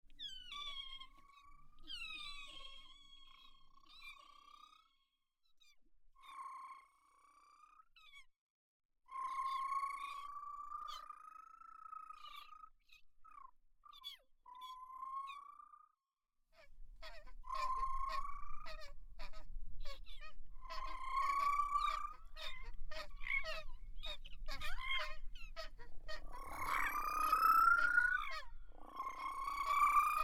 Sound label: estuary bird calls; erme estuary; creative torbay